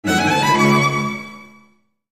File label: Music Logo Violin Ce